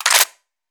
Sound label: reload normal